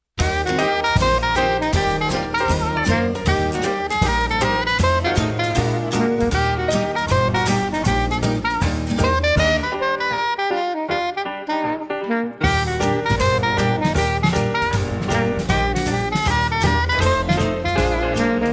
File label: Oldtime Jazz